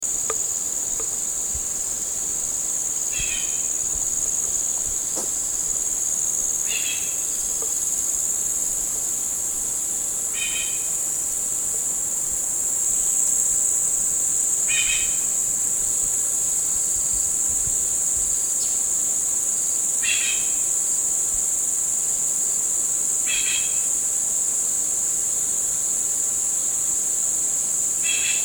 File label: bornean peacock pheasant